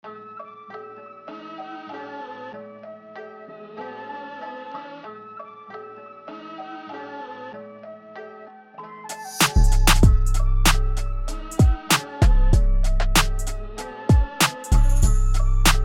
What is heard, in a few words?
C major 96bpm